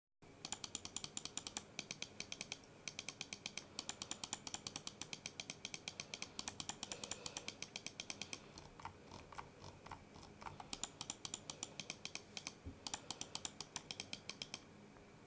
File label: Asus ROG Gladius II Origin